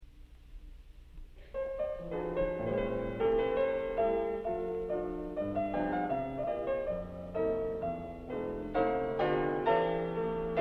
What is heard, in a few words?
Dance For Harpsichord; Delius